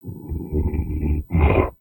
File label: Pet Buffalo Idle Look; creatures pets; Lego